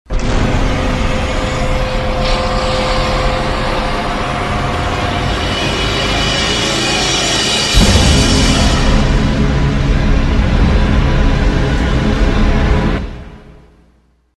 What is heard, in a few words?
Scary; Blair Witch Volume III The Elly Kedward Tale